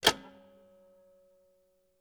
Old Metal Clock; 99Sounds; The Warehouse

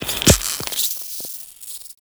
organic texture insect; BlueZone; Organic Lifeform Textures

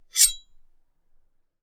gore - large metal knife muted ring; Timothy McHugh; Gorification [HD]

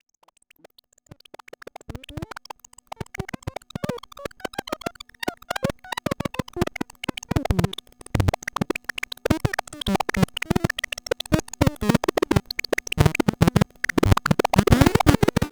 Textures; 99Sounds; The Weird Side Samples

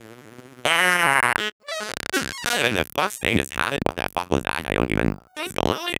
Vox, Circuitbend; 99Sounds; Mangling Audio

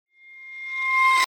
Sword Sound; FXHome; Fighting Sounds

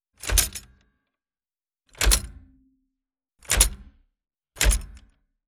Levers Sliders, Electric Typewriters; SoundBits

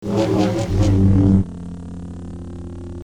Saber; FXHome

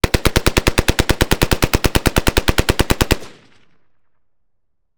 Full Auto Mag Dump Incoming; WatsonWu

